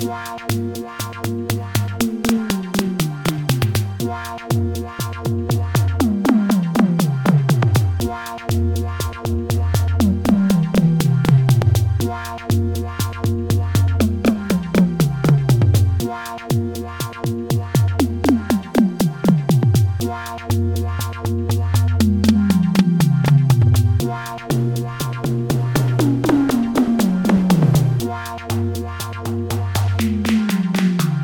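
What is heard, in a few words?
acoustic toms; Toum 1.0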